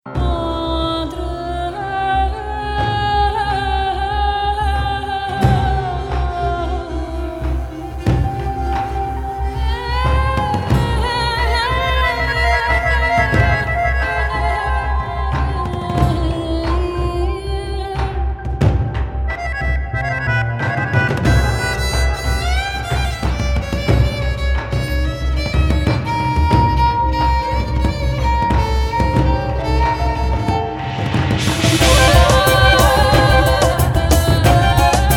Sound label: Ethno